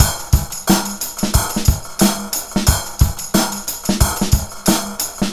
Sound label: Combo, R&B Drums